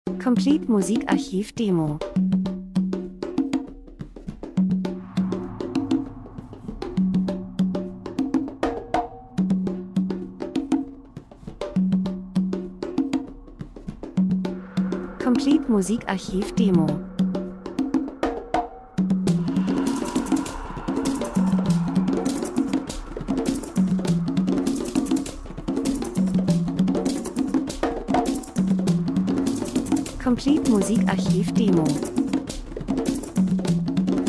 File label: drums; Variation Percussion Afrika Traditionelle Trommeln Sounddesign Land und Leute Buschmann Reisefilm Natur Dokumentation